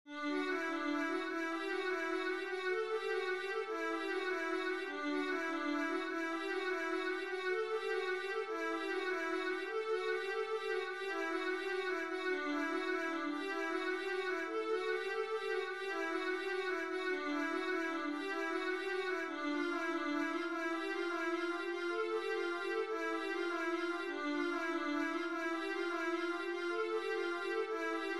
vla etude, viola

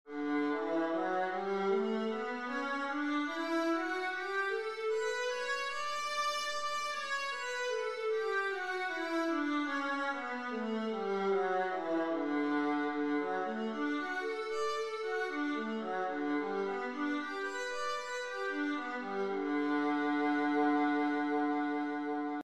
viola d major, scales

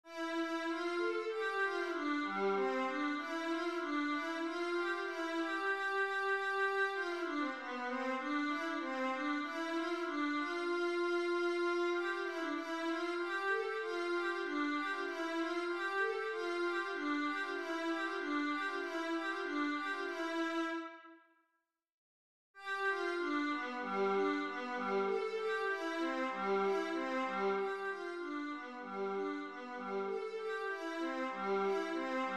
beethoven viola

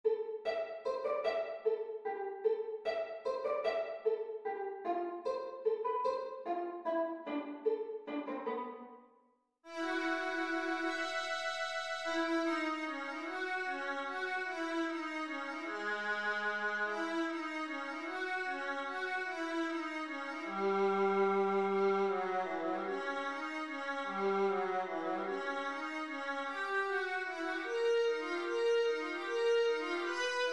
assez vif vla

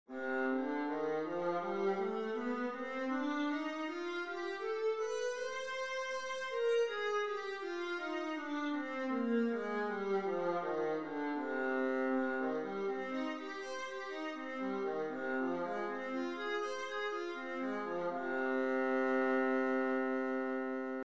viola c minor, scales

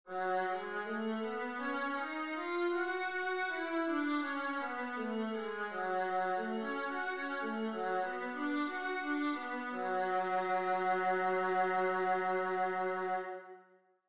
viola f sharp minor, scales

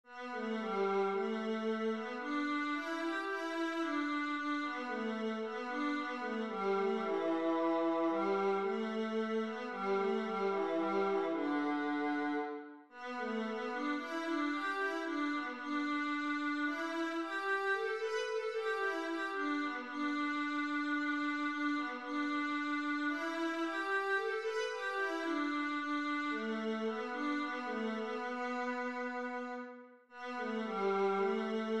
jasmine viola

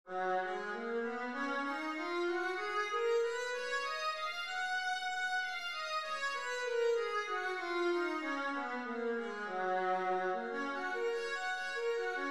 viola g flat major, scales

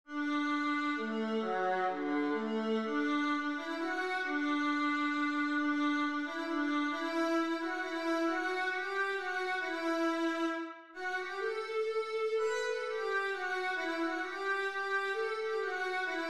minuet viola melody

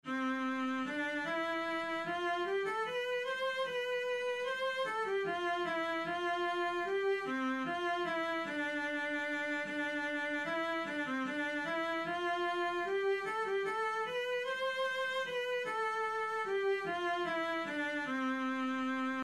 minuet Vla, viola

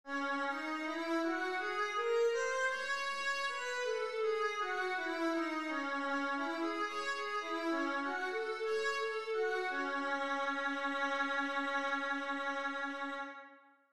viola C sharp minor; scales

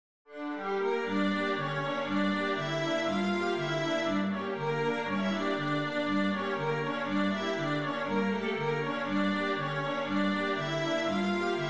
bulgarish full

